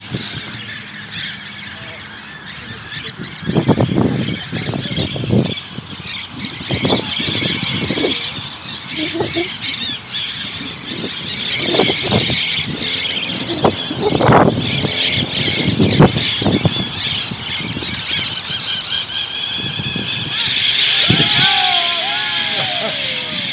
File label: Red-crowned Parrots, Welaco, TX